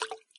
Droplet; HedgewarsMobile

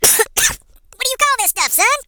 Poison Cough; HillBilly

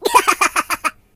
Laugh, voices Surfer; hedgewars

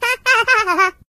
Laugh, voices; Singer; hedgewars

